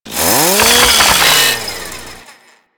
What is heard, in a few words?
Ghoul Sawman Saw Ride; Warframe; Grineer Ghouls